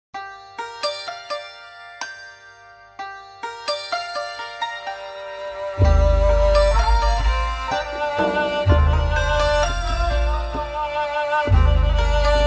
Kamancheh Santur

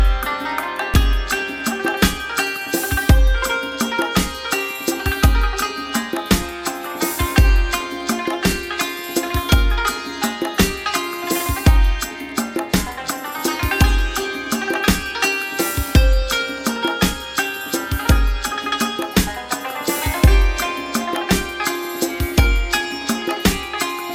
Santur

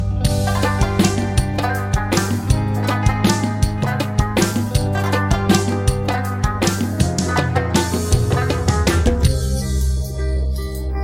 Afghani

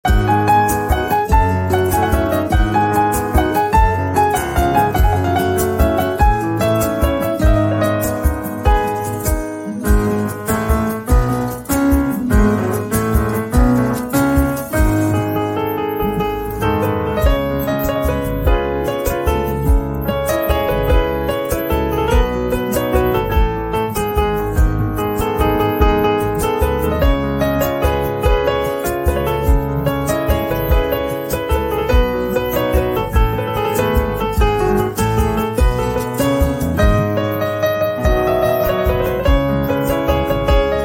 Piano

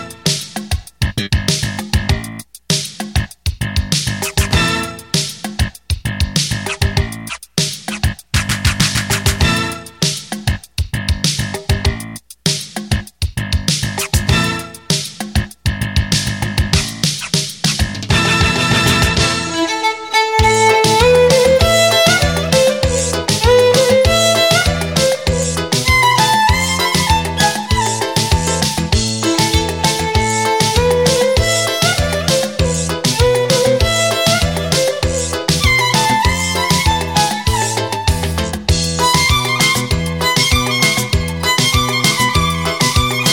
Violin

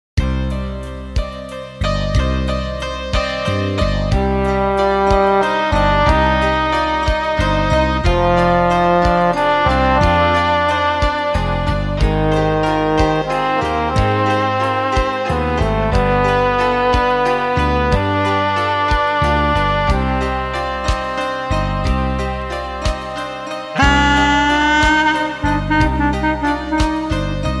Saxophone

